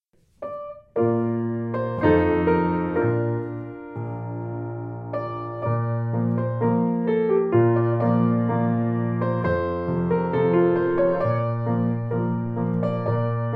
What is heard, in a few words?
piano